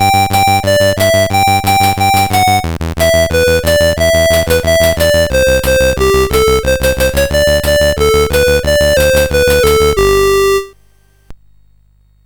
Blues, CSG8580R5

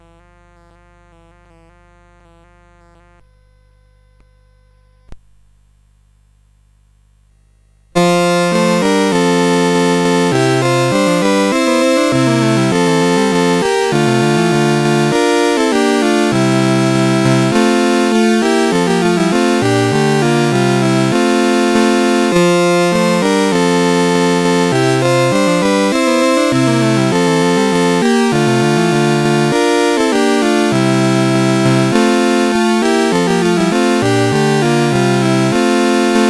CSG8580; Tant que Vivray; CSG8580R5

